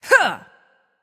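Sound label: grunt attack, female; smite; voicepack; hera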